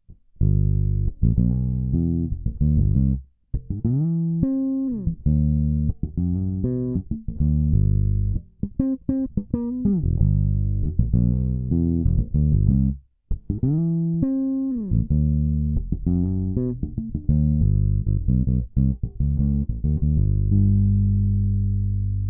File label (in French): PB57 flats tona off; LaBella FS